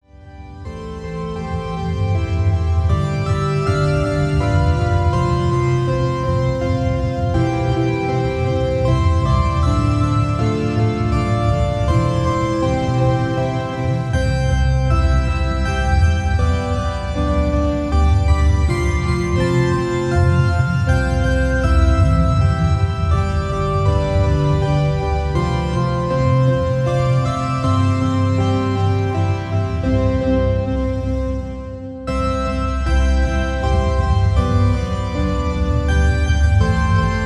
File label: Synth Ominous Scary